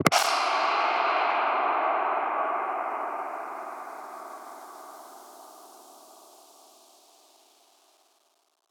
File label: Synth; 7rystan's 700+ FREE COLOSSAL EDM SAMPLE PACK; SFX